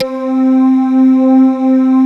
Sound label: JV PADS; Pro-Rec - Synthsonic